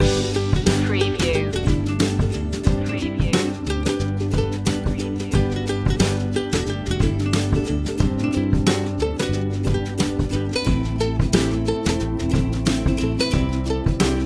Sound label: inspirational ukulele loop